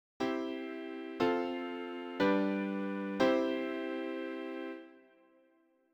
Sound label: Progression en cercle I IV V I; Chord progression